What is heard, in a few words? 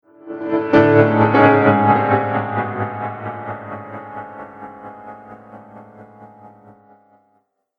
Horror Piano Tremble, Piano Sound Effects